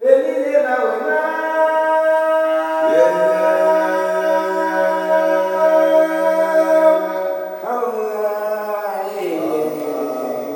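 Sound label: MALE MOURN, MALE CALLS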